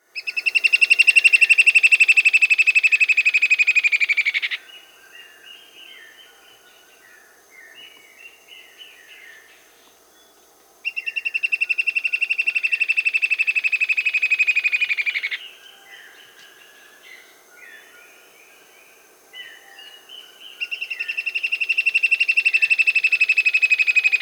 White-browed Antbird (Myrmoborus leucophrys), Peru; S. America